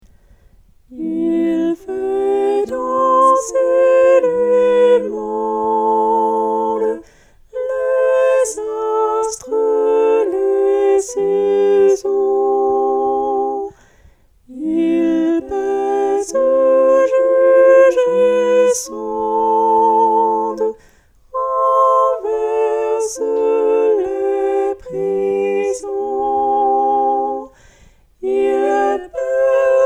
Il Fait Danser Les Mondes Tenor

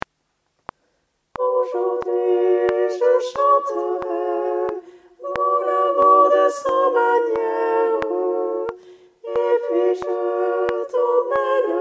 soprane; Version chantée; Aujourd'hui, je chanterai

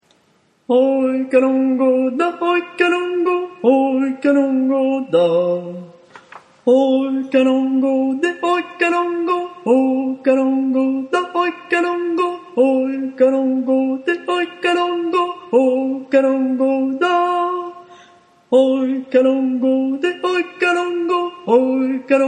basses; calango

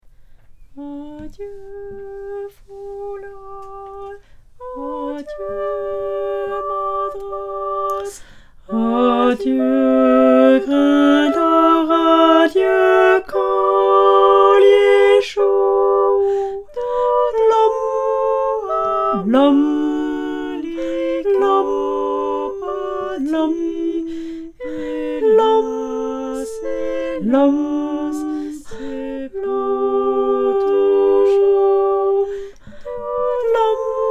Tenor Basses; Adieu Foulard

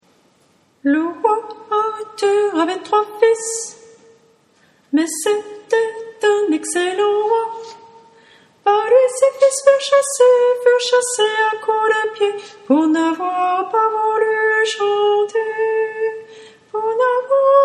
sopranes; Le roi Arthur

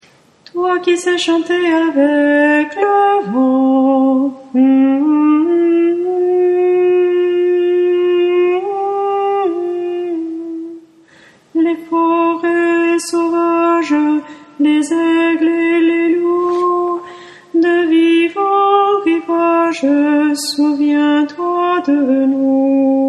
la terre est si belle altos

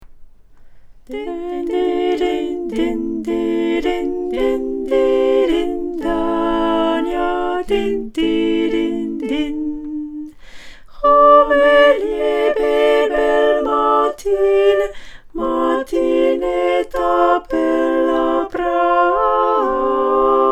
Din Di Rin Din Sopranes

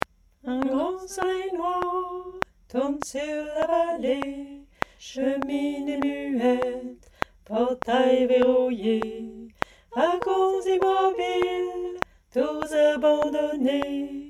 alti

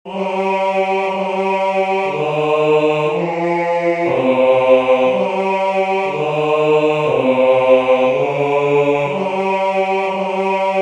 noel bass

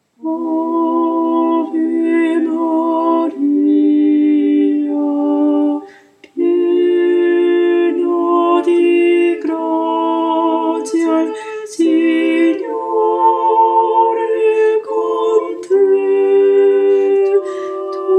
sopranes

